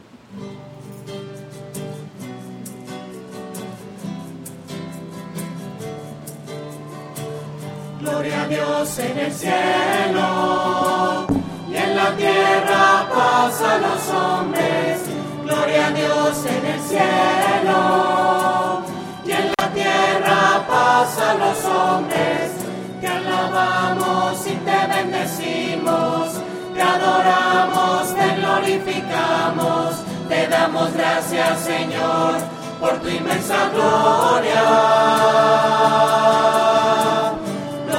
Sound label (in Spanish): gloria voces; misas 2012